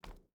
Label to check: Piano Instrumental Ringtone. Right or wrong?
Wrong